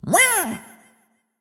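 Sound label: jump; Pulo; Super Mombo Quest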